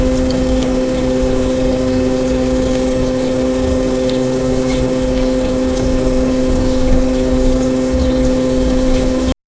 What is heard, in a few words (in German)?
angemehmes sattes piepen, countdown